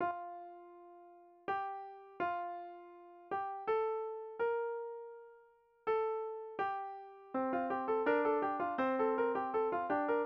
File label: Chorale Prelude on Hyfrydol